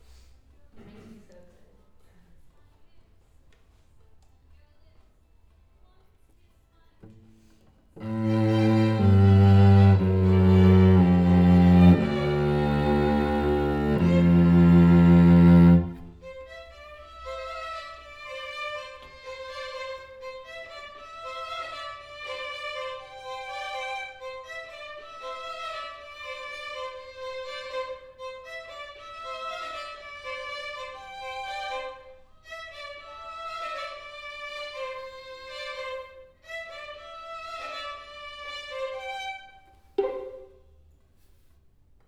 Cello

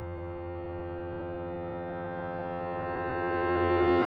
PNO

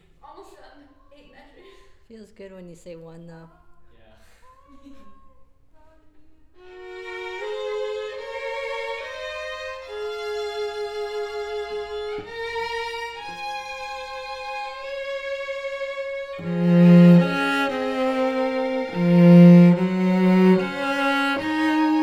Cello

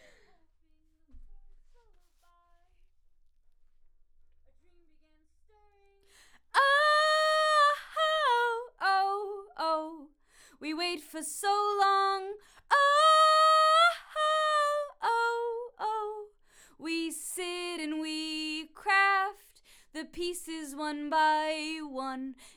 Vox start new

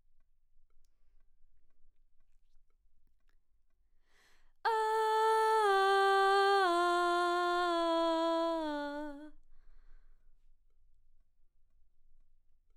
BG Vox

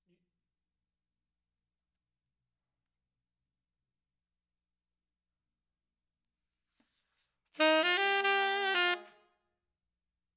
Tenor

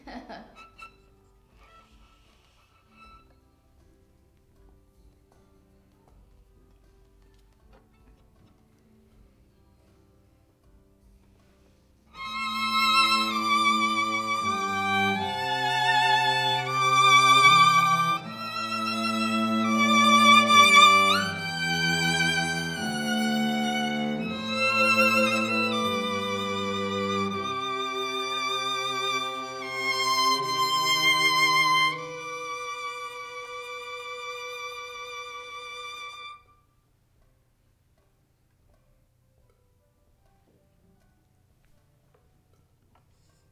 violin

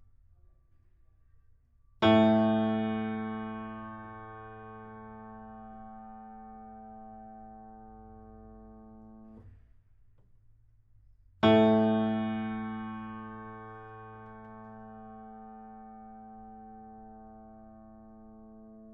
PNO In

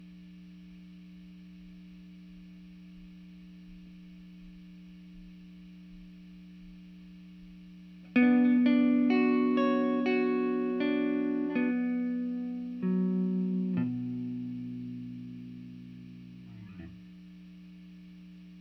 GTR

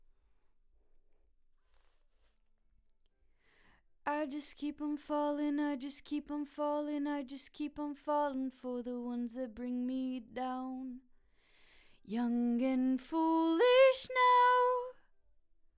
BG Vox

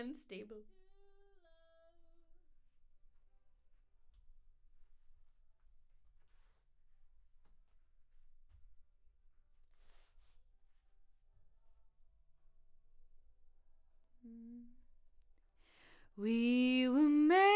Vox new

